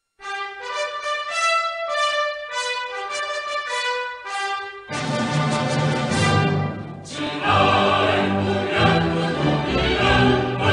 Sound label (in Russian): gimn knr